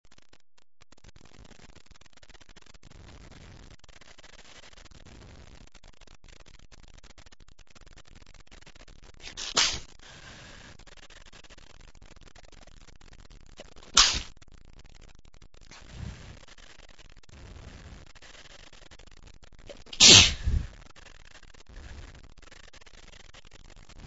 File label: stifle sniffle blow, male